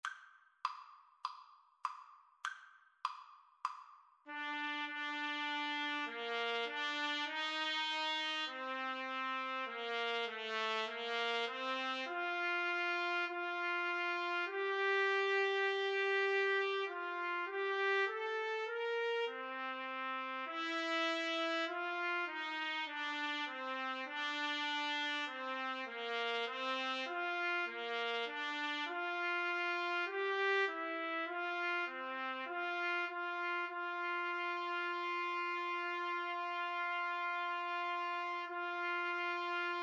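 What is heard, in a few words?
alto sax trumpet, Trumpet-Saxophone Duet; O Come All Ye Faithful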